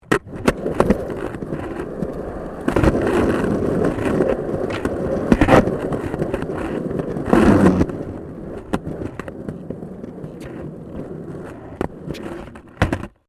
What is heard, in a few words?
SKATEBOARD UNDERSIDE CONCRETE; Skateboard sounds; Skateboarding; Sports